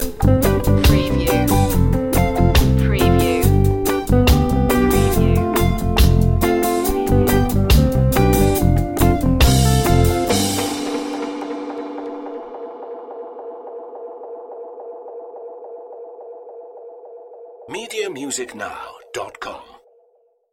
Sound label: summer reggae jam stinger